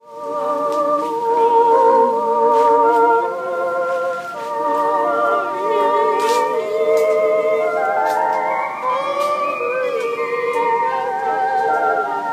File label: RINGTONE